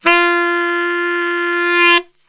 F 1 SAX, Brass Super Section; Best Service

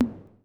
CONGA H; Crypton - DrumBase5000; KR55B

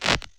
time rip

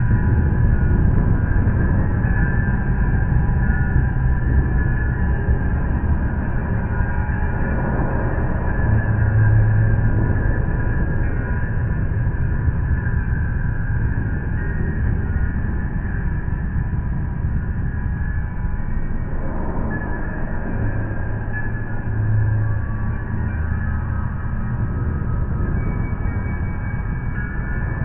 Lava Chimes, Ethereal Textures; Sonic Foundry (Sony Creative Software); Ma Ja Le; Soundworlds

